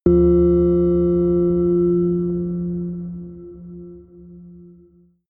melodic odd harmonic bell low

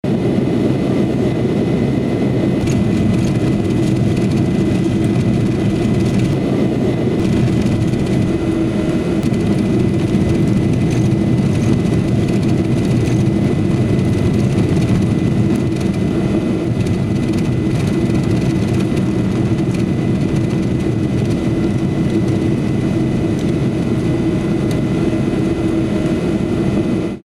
airplane cabin inside storm

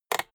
rotary control short fast turn hard

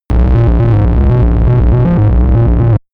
game arcade underworld

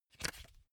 magazine flip forward fast

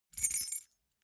key ring small rattle air

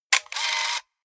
camera

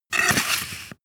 sword scabbard handle pull

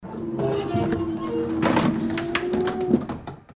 tram door closing

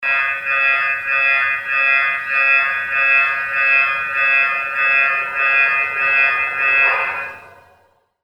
burglar alarm

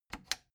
plastic button latch loose click disable fast

